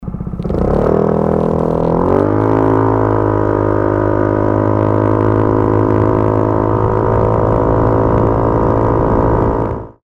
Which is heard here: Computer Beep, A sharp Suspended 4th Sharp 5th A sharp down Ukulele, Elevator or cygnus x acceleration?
cygnus x acceleration